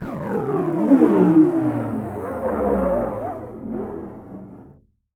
LOOP Frotte Grogne Mono

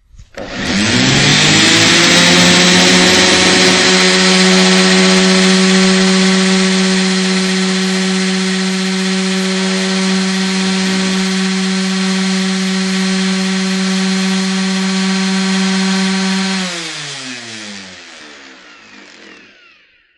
machine ponceuse

